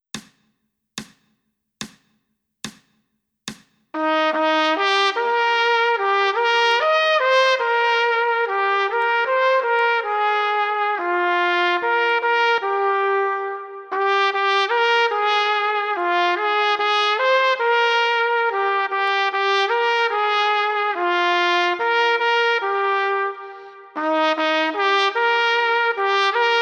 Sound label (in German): Am Weihnachtsbaume die Lichter brennen 1. Stimme